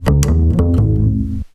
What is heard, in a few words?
Upright F, Basses; Total Drum&Bass